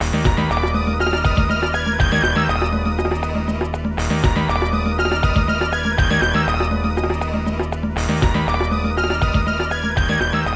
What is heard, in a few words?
Winning Theme; Vampire Chronicle for Matching Service; Dreamcast; Aulbath